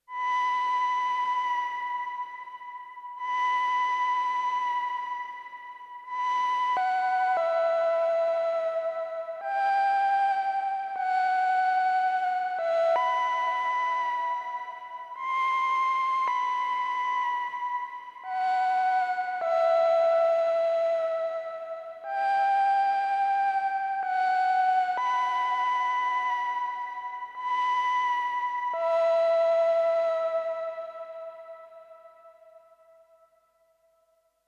reed, synthesizers, MS-20

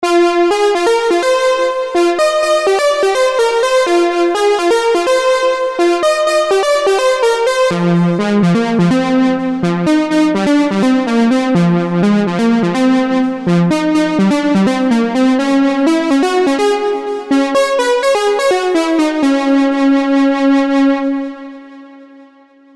lead, synthesizers pro-one

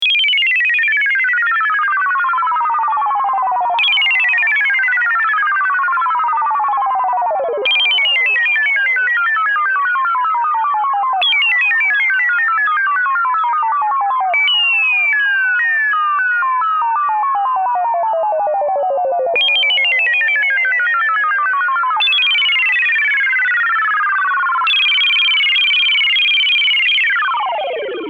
effect, synthesizers pro-one

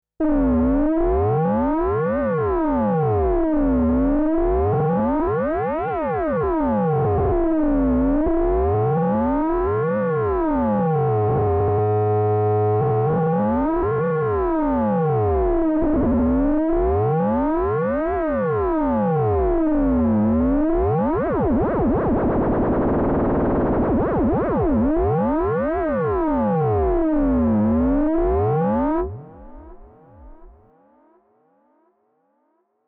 ring thing, synthesizers pro-one

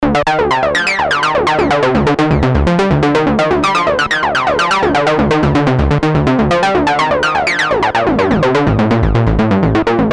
303ish, synthesizers pro-one

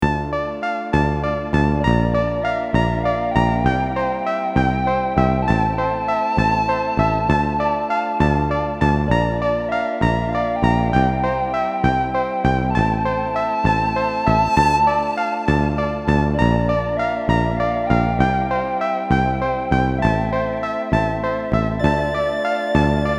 yamaha sy1; synthesizers

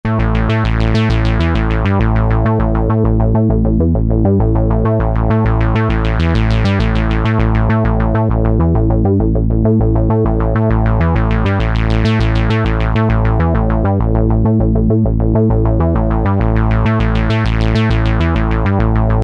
MS20 bass; synthesizers

